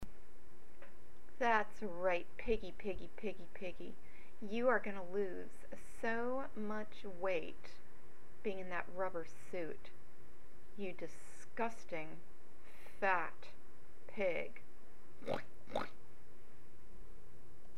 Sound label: rubber suit oinker